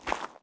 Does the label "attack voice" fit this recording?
No